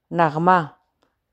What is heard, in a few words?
melody